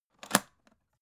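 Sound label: aluminium case small close short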